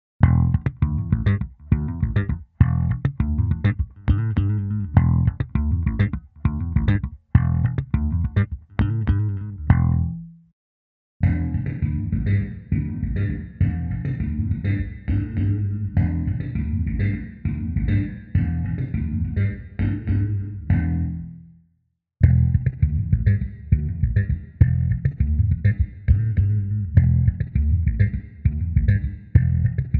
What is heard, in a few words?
Bassguitar; AtomicReverb